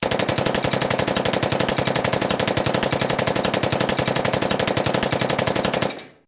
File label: Machine Gun Rapid Burst; bursts